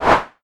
Message sent

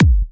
Kick great at 136 bpm

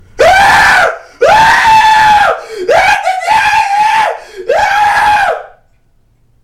Distorted Scream

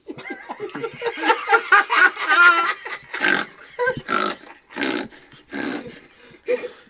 Laughters Male Snoring

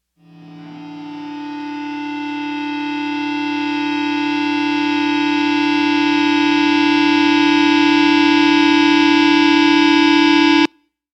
Guitar Feedback

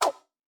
Cartoon Air Swoosh

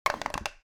Wooden Blocks Falling

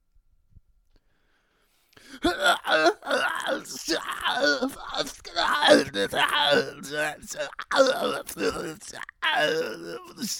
Choking dying

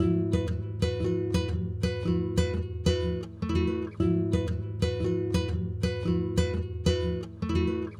Guitar

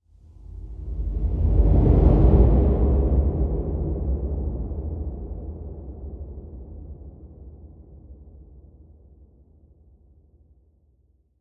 Low Swoosh

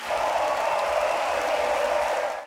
Arena Crowd Big Stereo Sports Event Full; Nova Sound

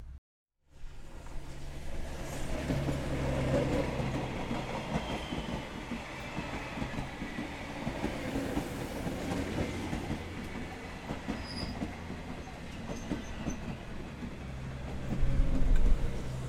Train Ambiance

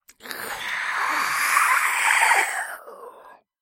Creepy Sound

